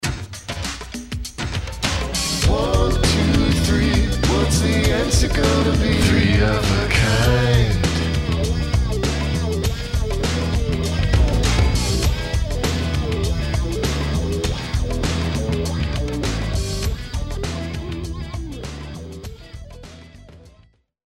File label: intro Wah-Wah